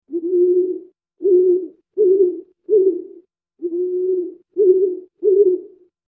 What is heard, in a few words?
Owl Hooting at Night; birds